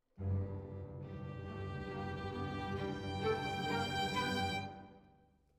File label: Violin Solo